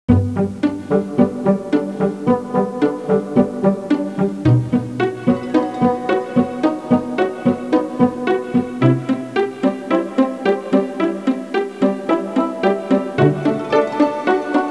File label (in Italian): musica sinfonica; symphonic